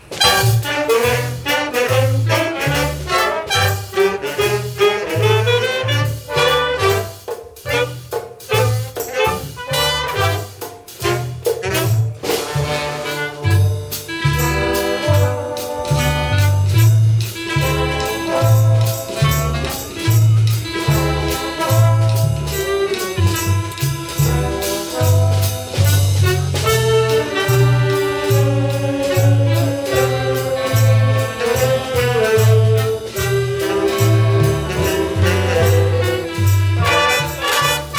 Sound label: concert band